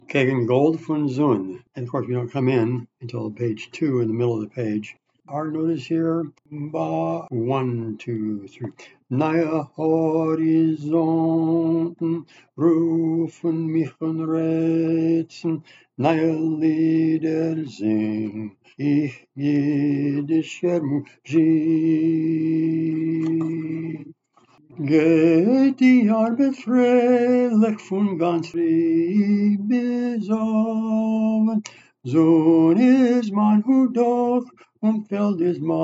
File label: Kegn Gold Fun Zun Bass, chorus